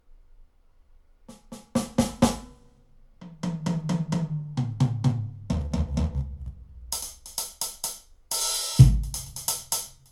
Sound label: Alesis Amp